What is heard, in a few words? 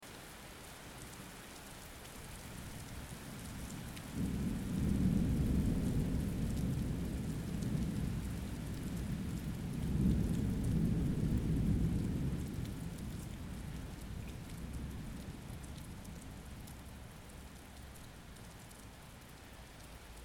Rain and thunder sounds